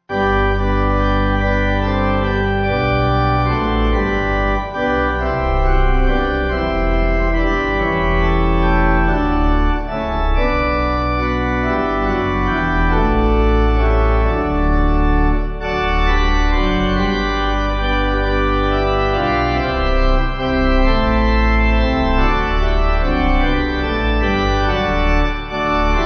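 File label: Pipe Organ; Stamford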